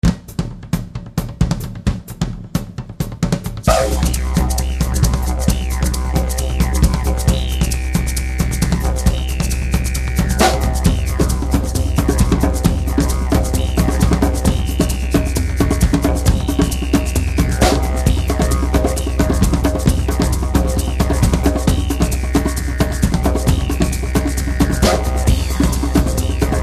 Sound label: Didgeridoo - Trance